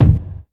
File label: bass drum one shot a key, steel kick drum sample tuned to the A note